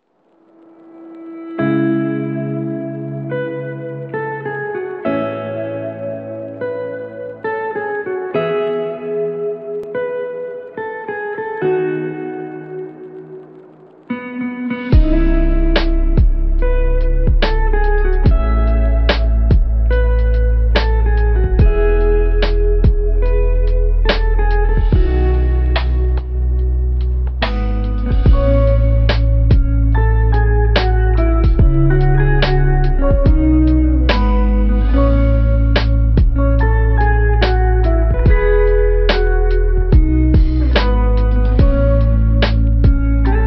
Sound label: Lofi